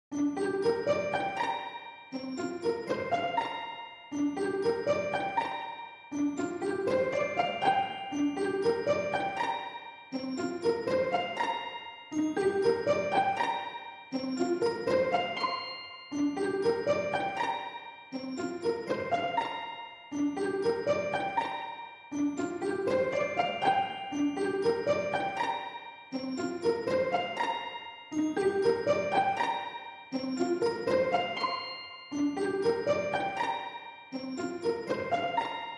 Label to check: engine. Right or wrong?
Wrong